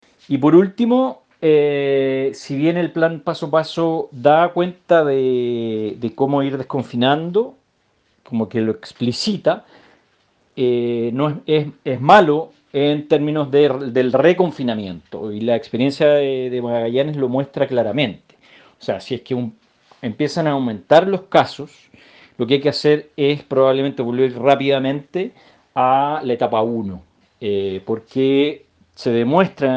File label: BASS